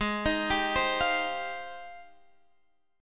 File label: Ab Major 13th Ab Guitar Drop D; 18,18,17,17,18,x